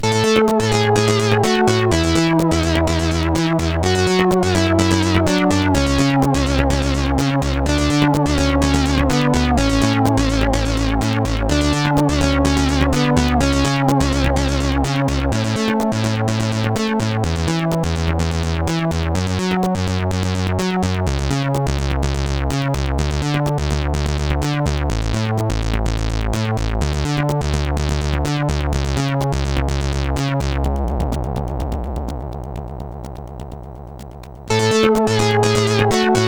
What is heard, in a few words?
tune, sid 8580R5